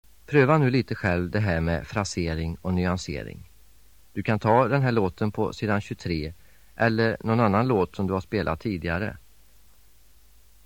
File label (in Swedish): frasering, flackekurs